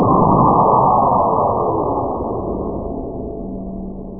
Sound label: Take Off; Woden1.3